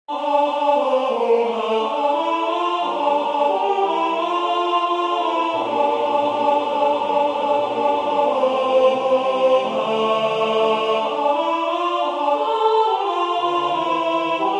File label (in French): alto; O Dieu de clemence